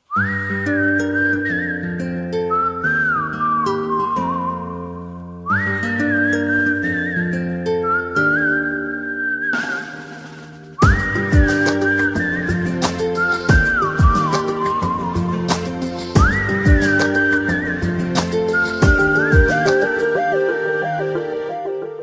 Best Whistle

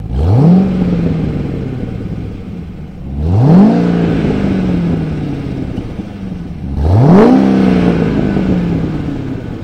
Vette Exhaust

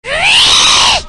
Ree Scream

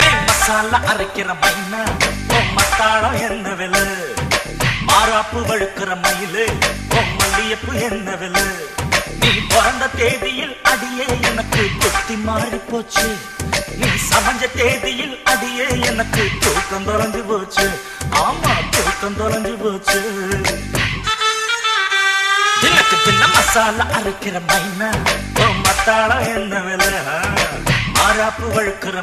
Tamil Gana

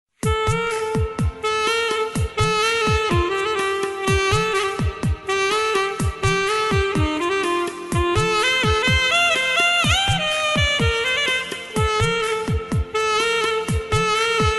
Dhun

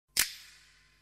Finger Snapping

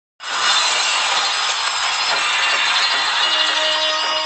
Lost Canary

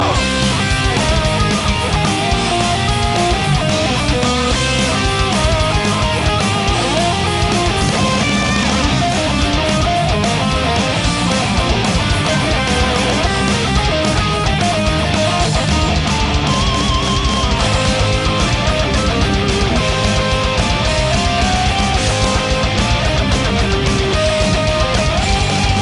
Rock

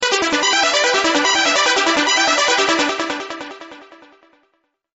Fast Melody

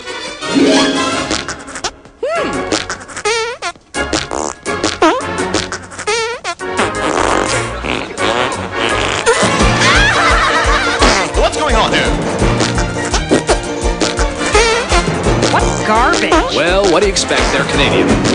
Fart Song

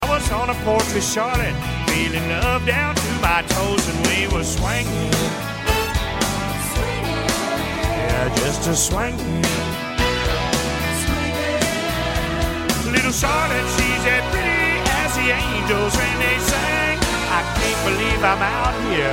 Swingin

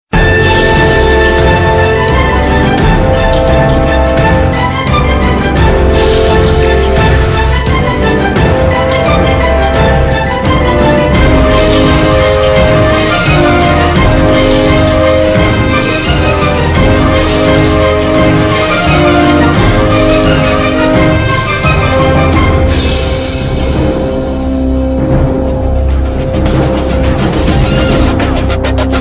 Ghost Music

